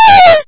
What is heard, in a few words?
lose; Kenney's Sound Pack; Retro Sounds 1